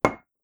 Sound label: placing Glass; voxelverse